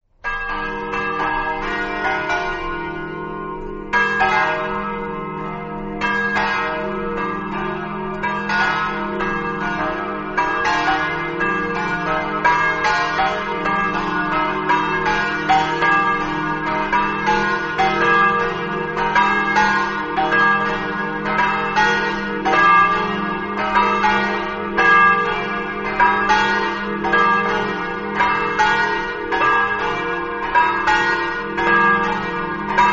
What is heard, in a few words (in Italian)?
barbeano, campane